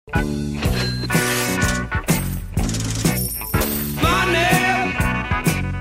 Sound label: twitch alert sound for subscribers follows donations